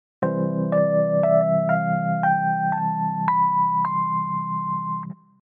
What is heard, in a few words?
C Chord and Scale